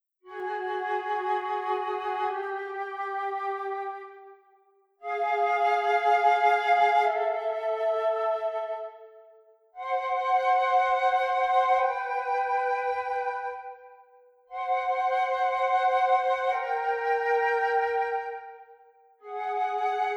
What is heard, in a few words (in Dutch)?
dissonant oplossing